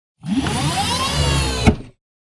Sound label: Robotic Movement; SoundsCrate